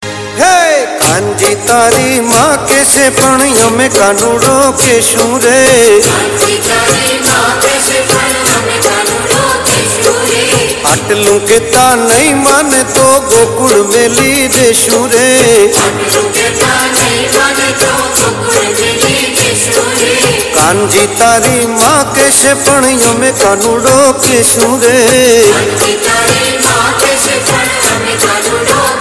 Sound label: Gujarati Garbo